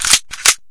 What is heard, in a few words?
sniper world reload, weapons; tf